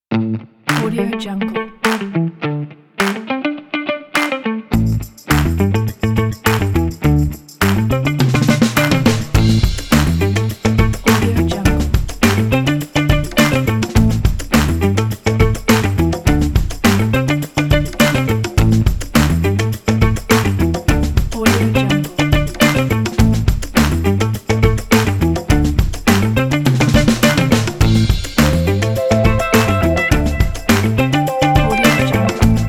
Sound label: funk